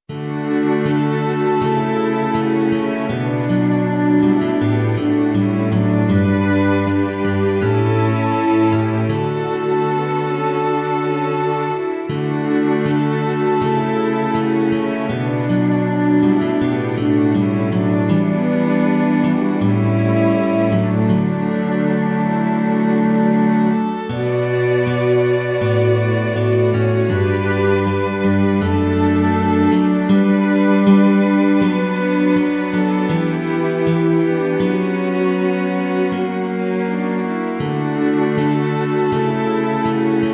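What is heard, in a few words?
Like a deer, Flute Recorder Guitar Guitar Strings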